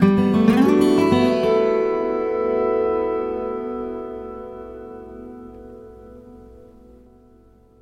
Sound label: acoustic guitar; sonic excursions for acoustic guitar; acid wav; magnetrixx